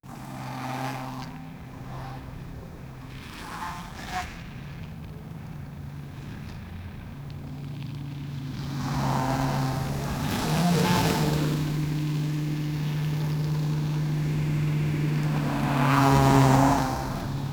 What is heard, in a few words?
bmw m3; sound effect